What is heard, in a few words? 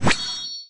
levelup; chiikawa-big-event; Chiikawa vs Chimera; sfx